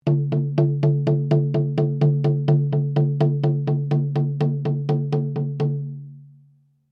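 Drum Flat Skin mezzo forte Sequence, Drum heads isolated from drum shell; Musical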